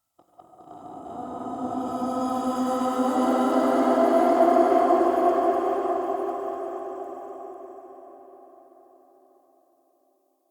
Crypt Spirit Lament; Ambience; Champion Crypt

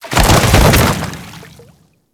Grineer Fall Water, Body Falls

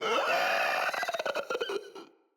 Infested Runner Death

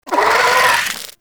Vocal Attack; Warframe; Moa Satyr

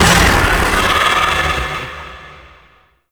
Dragon Elemental Breath Activate

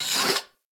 Moa Leg Movement; Warframe; Footsteps

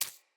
Root Spider Step Dirt, Foley; Animals

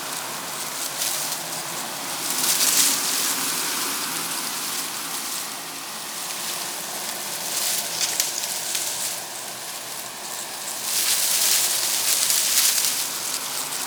tree wind rustle loop; Ambience; Props; Warframe; TheNewWar; Zariman

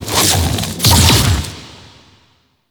Death Impact; Warframe; Dante

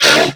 Pain Female; New War; Narmer Grineer

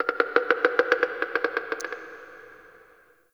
Wood Creak; Zariman; Warframe; The New War; Ambience

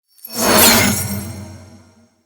Weave Boss Proj Whiz By; Cephalon Weave

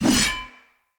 Sword Whoosh Heavy; Weapons; Swords; Fenn Jotar Sword

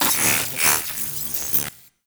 Venus Robo Fish Large Caught; Warframe

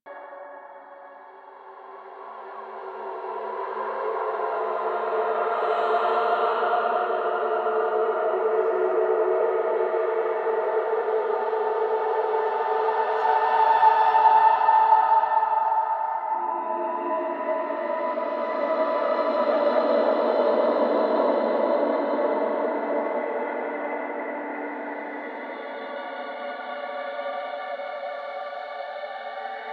Angel Song Loop; gameplay; Zariman; Warframe; NineteenNinetyNine; Stage11FuturePast

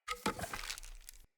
Staff Caster Equip; Weapons; Player; Staves; Starter

